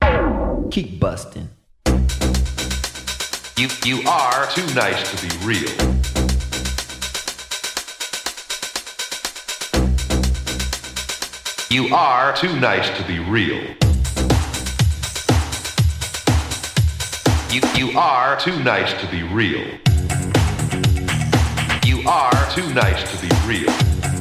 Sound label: Beat Version